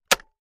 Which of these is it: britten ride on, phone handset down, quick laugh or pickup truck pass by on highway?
phone handset down